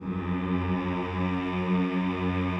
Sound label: CHO F#1, CHO Mmhh; Sound & Vision; Gigapack I CD 2; CHO Mmhh + Rrrr